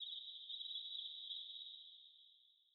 cave insect trill; Rust

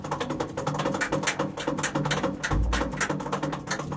cannon turn loop manual t34, tanks

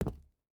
footstep rubber boots concrete jump land; Rust

